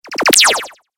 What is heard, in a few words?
8 Bit Style Mini Spaceship; Video Game Sound Effects